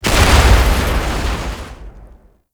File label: Red Barrel Explosion; Gameplay; Awakening Remaster